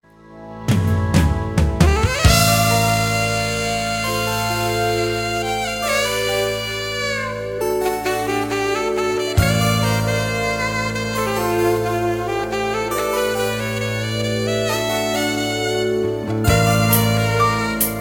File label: saxophone